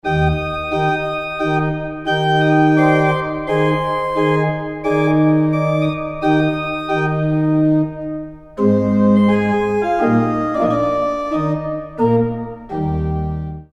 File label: Resonance Organ Resonance